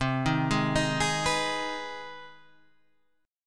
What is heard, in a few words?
C Minor Major 7th Sharp 5th, C; CmM7#5 Chord {8 6 6 8 9 7} arpeggiated